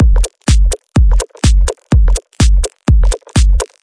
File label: 125 bpm happy tech house, drum loops and breaks